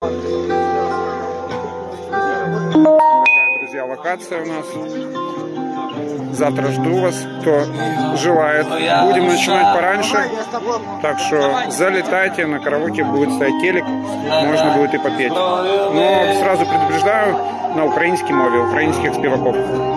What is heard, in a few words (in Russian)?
Караоке на украинском